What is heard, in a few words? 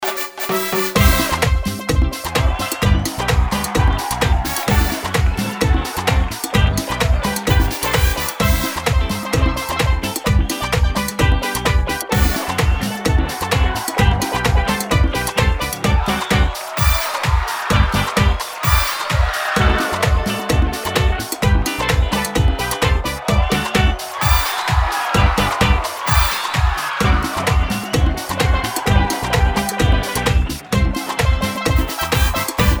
calypso carnival